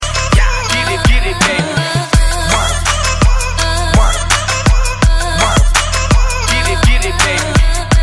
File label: follow effect